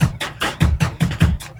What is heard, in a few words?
LOOP, Drum & Bass, 150 BPM